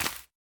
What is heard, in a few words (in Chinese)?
竹笋放置声